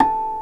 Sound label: BOTTLES; OMI - Universe of Sounds